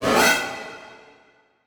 Transition Metallic Hit; Production Element; Title Transition; AdobeCC

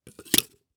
Electronic Flashlight Insert Batteries

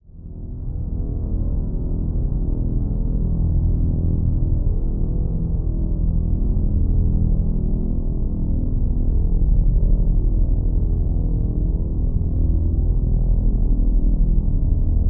Drone Musical Subsonic; Production Element Imaging; AdobeCC

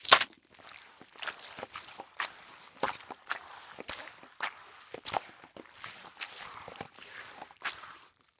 Liquid Water Mop Wet Mopping Concrete Floor

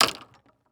Sports Club Golf Club Dropping Into Golf Bag

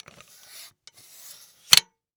Tripod Light Tripod Extending The Legs; AdobeCC

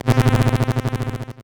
Title Transition Electrical Pulse; Production Element Title Transition

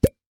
Household Candle Glass Candle Pop Open Lid

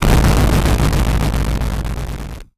Title Transition Burst; Production Element Title Transition

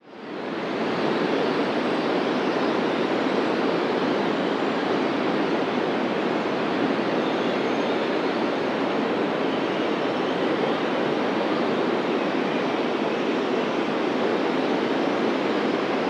Weather Ambience Wind Blizzard Snow Whistle Gust

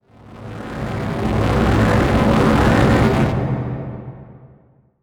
Title Transition Distortion; Adobe; Production Element

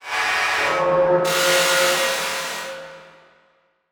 Transition Feedback Distorted

